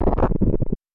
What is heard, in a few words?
Rocks; Super Smash Bros